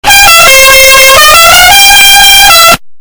car horn earrape

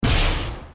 hitsound

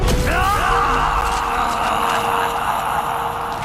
dying noooo; raiden

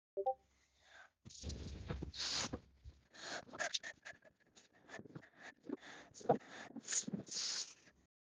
windy

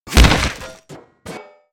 fall damage; gmod

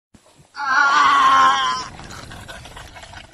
aaaaaargh, scream

